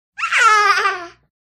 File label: Happy Baby Walla, twenty month old